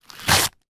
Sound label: foley sticker security rip fast from cardboard box; zapsplat